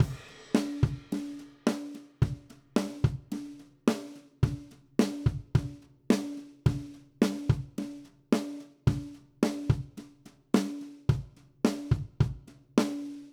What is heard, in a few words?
Country Drums (Chorus 3); Digidesign; Pro Tools Essential Loops